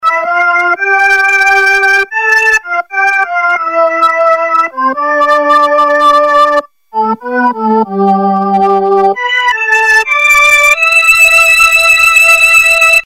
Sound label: jp8080 string; MELLO